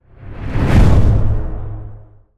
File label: Cinematic Deep Low Whoosh Impact